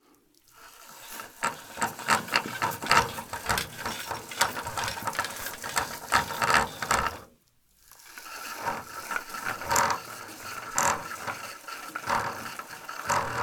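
BOAT Med Pull Drop Hang with 3 Pound Weight, Boat Pulley; Secret Source; 001 Boat Pulley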